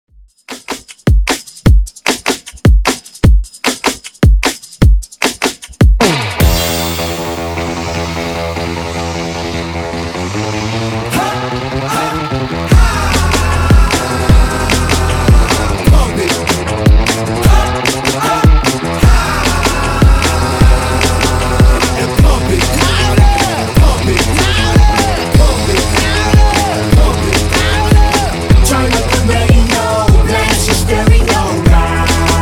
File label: 76 Bpm